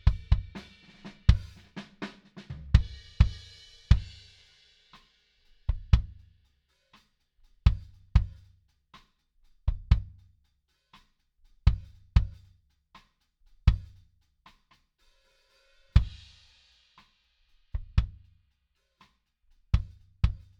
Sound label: Kick